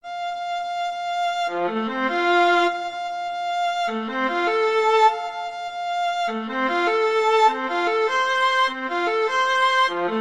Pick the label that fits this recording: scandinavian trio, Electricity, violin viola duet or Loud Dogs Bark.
violin viola duet